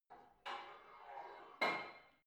barbell heavy plate put on soft reverby, fitnessstudio; sport freizeit